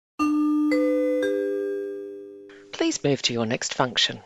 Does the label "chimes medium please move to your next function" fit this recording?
Yes